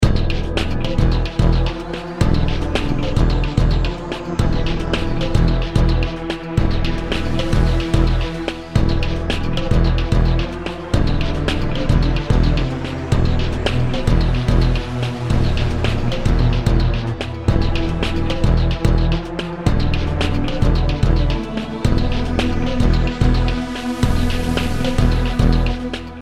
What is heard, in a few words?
music ingame; CAC ASSETS